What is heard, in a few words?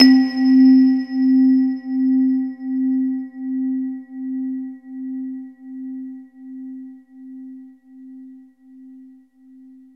TINE EPIA, KURZWEIL K2000